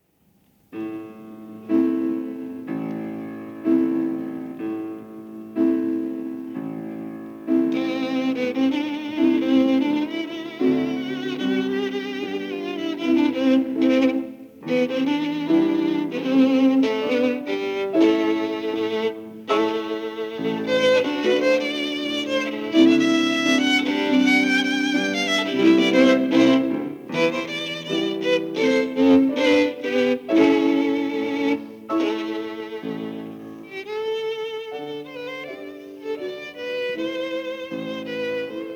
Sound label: Romanian Folk Dances Stick Dance; Sz 56 I Jocul cu bata